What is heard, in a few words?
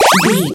retro powerup collect